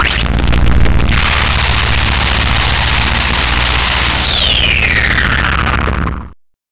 META FX, Hard & Loud Techno; USB Soundscan vol.01; AKAI

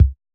kick, Roland MC-909

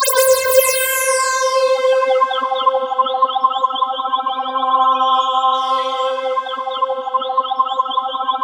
C5, SynthLine; 90 s Sample CDs; NiceBeats; SynthLine VOL-2

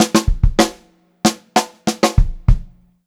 FILL, Pop-Rock Drumloops; USB Soundscan vol.37; AKAI; 96 POP

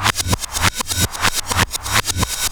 backscratch, scratches; Zero-G; Freak Beats

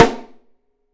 Drums, MARCHING; 3D Drums; OP MARCHING

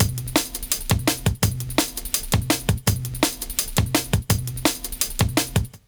LOOP, Jungle; Explosive Jungle; USB Soundscan vol.05; AKAI

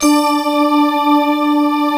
SYN D50, Roland; Sound & Vision - Gigapack I CD 2 (Roland)

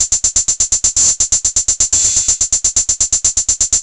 drum loop electro hat 125, Classic Chicago House; Sample Magic; Drum Loops

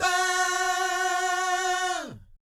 VIB FX, Vocal Planet Jazz & FX; ILIO; JAZZCOMBO

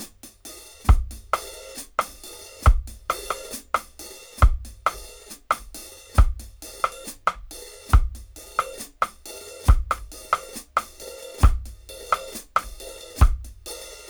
DRY, DRUM LOOPS, 068 BPM, Dub; Drumdrops In Dub VOL-1

